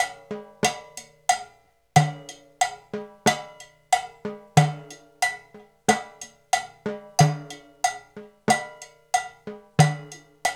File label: 93 CHA, Cha Cha Cha, African Contemporary; Spectrasonics; Supreme Beats